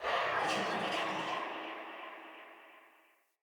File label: Transmission In; Fenn Jotar; Transmission In Outs